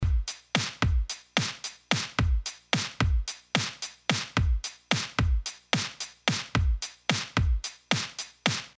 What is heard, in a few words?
Drums Before; SuperVHS